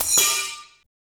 CAT CRH, Industrial; USB Soundscan vol.24; AKAI

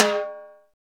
TIMB, Timbales; Roland L-CD701; PRC Latin 3

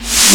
SNARE REV, snare fx; Big Beat Samples & More; AKAI

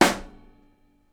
SNARE, Live Snares

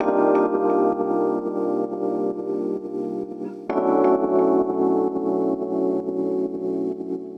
Epian Loop 130; Equipped Music; Loungin House

